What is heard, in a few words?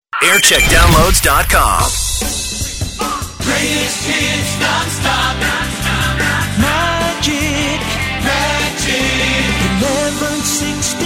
jingle montage; magic 1161; 2003